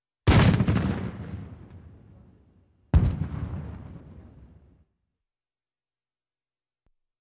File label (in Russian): Tank M60 vystrely; Танк М60 выстрелы; Звук танка в движении, заводка, старт, остановка внутри и снаружи. Выстрелы танка